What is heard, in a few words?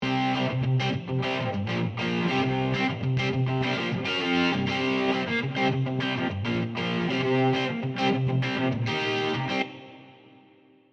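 Oxford Distortion Guitar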